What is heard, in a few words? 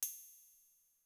Cym; Sonic-Potions LXR; Forrest